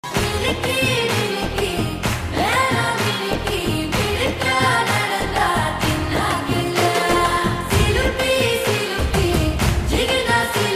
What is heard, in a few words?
song; Chiyaan Vikram